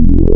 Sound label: Formant Square, wavetables, Digital; Massive 4-Digital-Hybrid